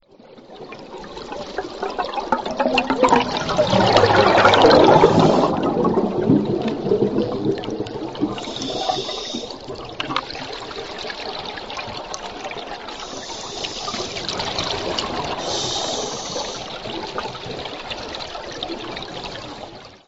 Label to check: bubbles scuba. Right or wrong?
Right